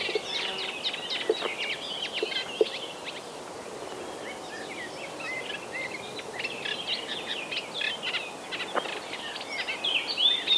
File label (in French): rousserolle effarvatte